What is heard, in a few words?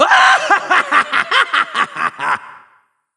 evil laugh; Hazama